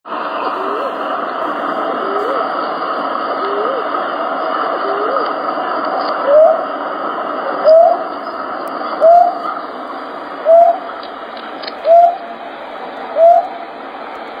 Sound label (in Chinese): 彩鷸